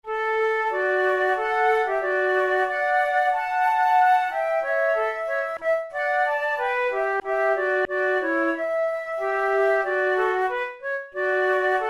duet, flute

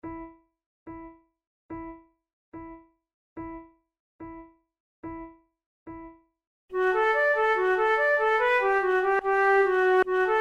duet; Over the hills and far away

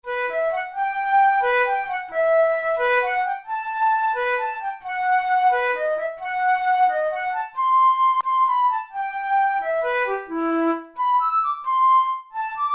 studies for the boehm flute; drouet 72

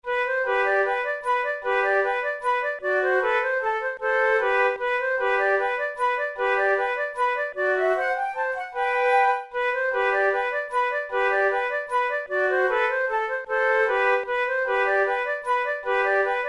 bohemian waltz duet, flute

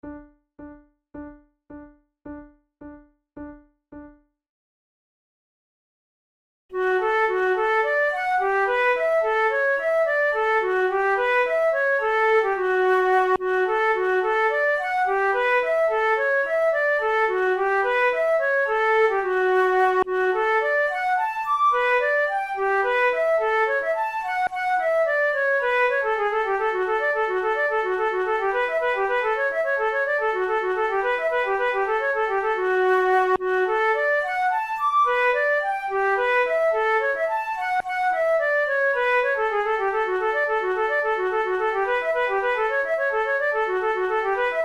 duet, flute

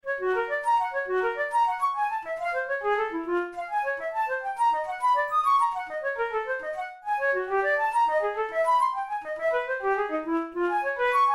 studies for flute solo; karg-elert

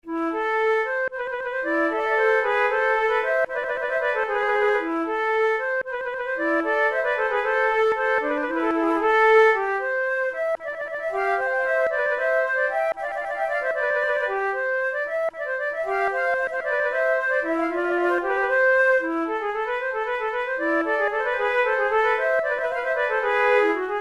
two flutes; Monteclair; 6 concerts; la terpsichore; flute tunes

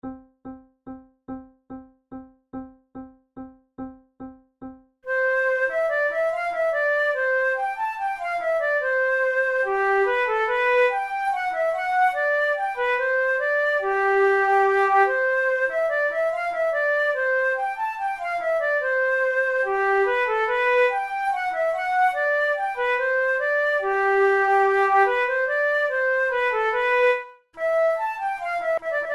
menuets; Naudot; 6 babioles

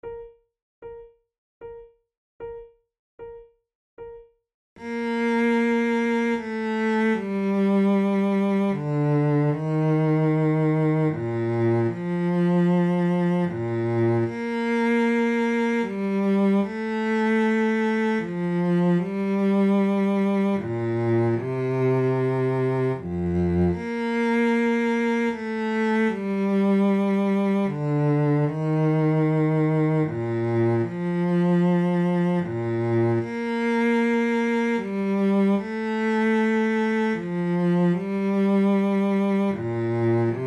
trio sonata sarabanda, flute tunes; corelli

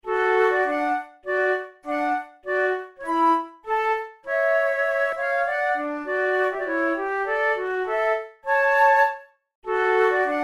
duet

